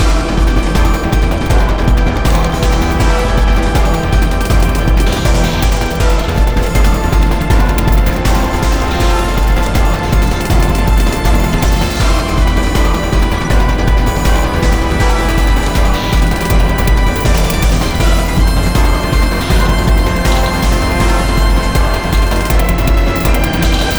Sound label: Steelworks Fast; rive wreck hack die retry; ps4